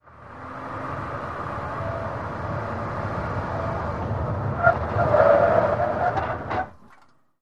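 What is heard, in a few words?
SUV Truck 180 Rev Skid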